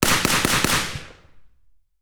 FAMAS G2 5.56mm 1m left on ground clean Four shots; DPA4062